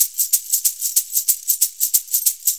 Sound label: Shuffle Perc, Perc Loops; Sonic Foundry (Sony Creative Software); Drum Tools; Shuffles